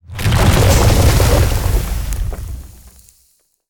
Seismic Blast Staircase; Evolve; Behemoth

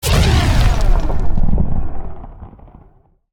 Leap Attack Impact Swt FLAME; Evolve; Meteor Goliath

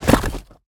Tongue Grab Pull Back; Evolve; Behemoth

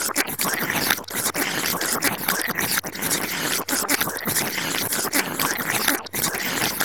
Gooberball Idle Loop; Lurker; Evolve; Gorgon

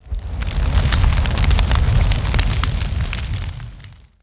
Flame Breath Swt; Evolve; Meteor Goliath

